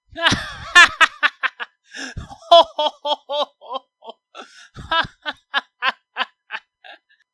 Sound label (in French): rire